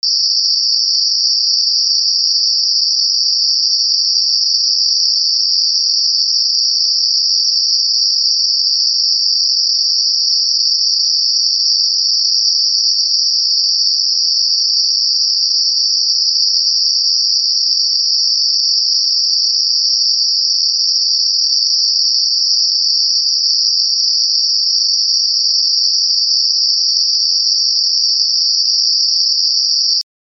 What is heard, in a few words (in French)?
bruit rose